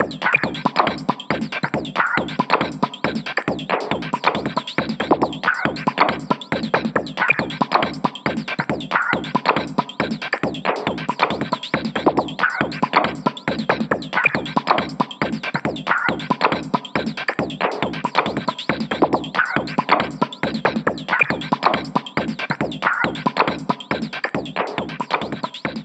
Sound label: BoomBox loop